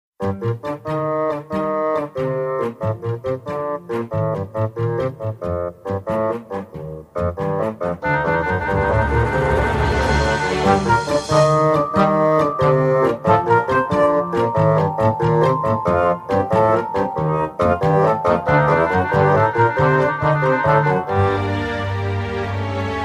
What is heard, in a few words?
lute; jagd funny